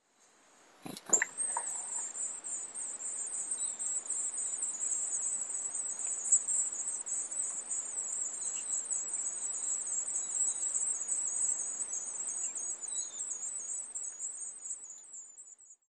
yamagara, chichibu

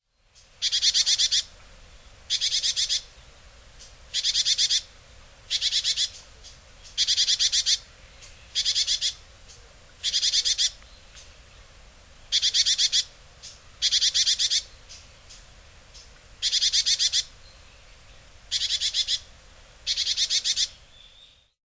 sijuukara, hyogo

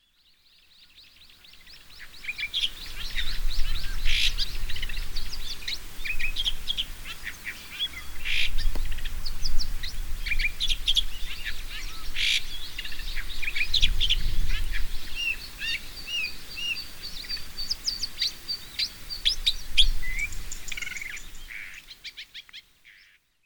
takasagomozu, yonaguni

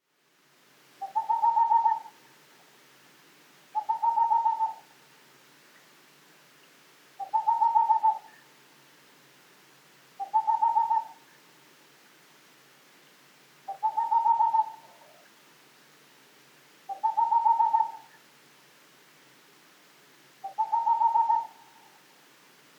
kinmefukuro, tokachi

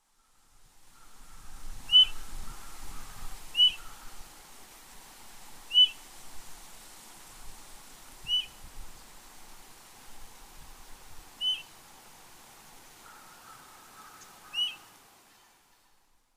akauso; koe; sakata; yanagawa

